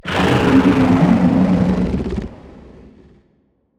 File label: attack; Barotrauma; Endworm